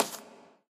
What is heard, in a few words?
tile, footsteps